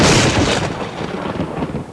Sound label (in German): DONNER